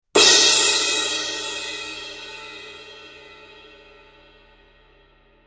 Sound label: stage crash 18, cymbal; sabian; hhx